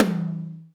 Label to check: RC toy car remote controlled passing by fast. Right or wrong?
Wrong